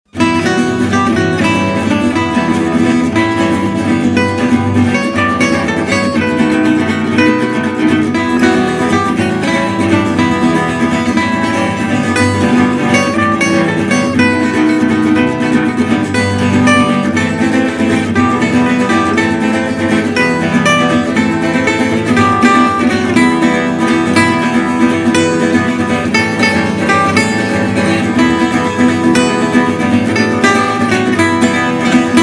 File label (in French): chant kibbutz guitare version